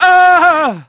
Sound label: fall; PHANTOM; Pulse Racer